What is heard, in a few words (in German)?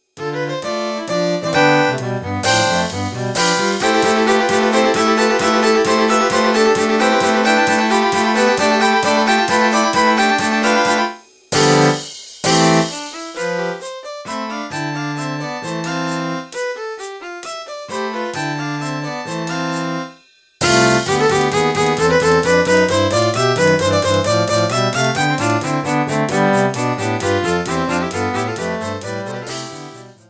Quintett